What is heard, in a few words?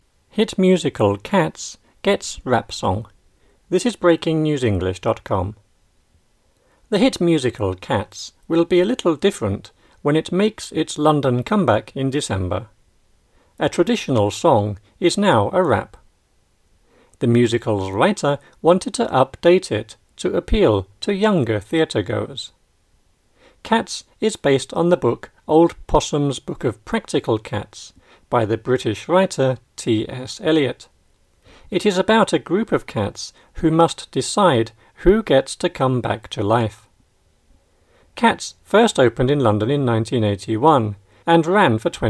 rap song